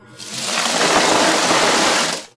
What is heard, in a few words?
aluminfill